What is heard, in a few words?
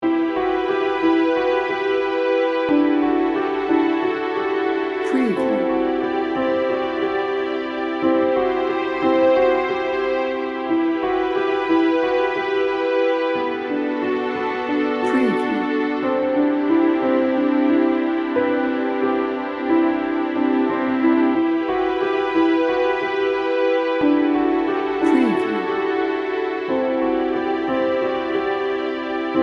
piano dreaming loop